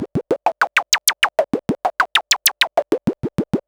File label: Modular 130BPM; noise hiss crackle